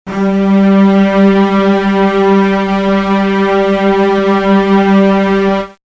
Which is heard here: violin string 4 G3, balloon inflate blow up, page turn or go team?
violin string 4 G3